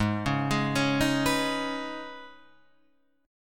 D sharp 7th Suspended 4th Sharp 5th G sharp, Guitar